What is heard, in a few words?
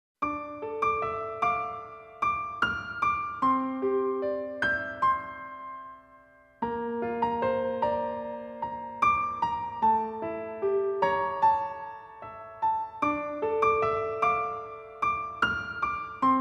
Piano Version; Shikabane Hime Kuro